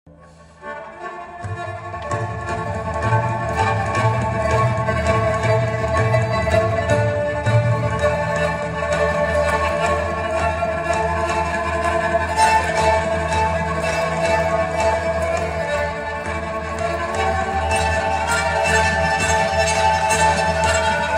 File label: fiddle